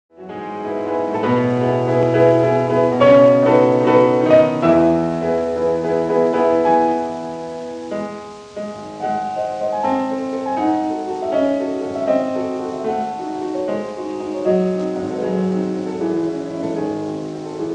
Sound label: Symphonic Etudes for piano Marcato; Schumann; Op. 13; Variation 2; Marcato il canto